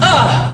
proxy hurt; billy herrington; slender

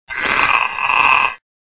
slower attack; cof; suicider

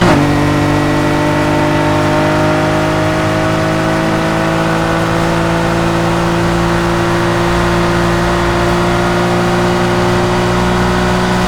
fourth, vehicles; skyed; nfs heat ganimator pack

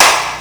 hit; billy herrington; slender

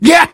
engineer pain severe

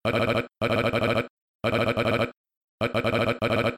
win; freak fortress 2; dell

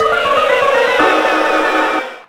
failure; tf2ware ultimate; music game; wii mona

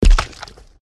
walk, footsteps

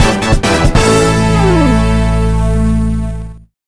game over; tf2ware ultimate; music game; ds touched

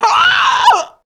female zombie pain; nmrih; zerosiki; slender

